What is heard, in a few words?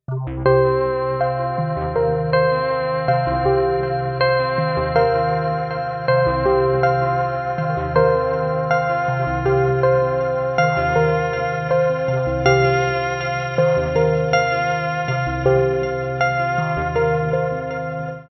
digitone